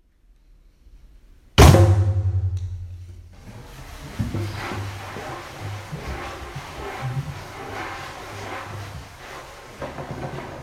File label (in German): Sommer Musik mit Trommeln